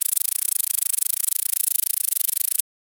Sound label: realistic rattle, reptile